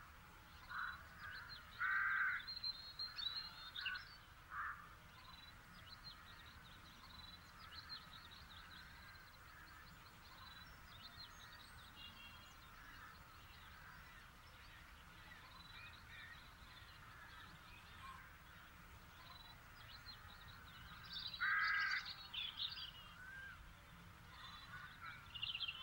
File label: bkg day, ambient background; STALKER; IWP